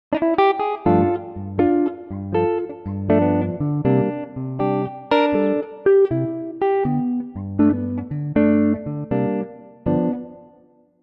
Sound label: G El Gtr; electric guitar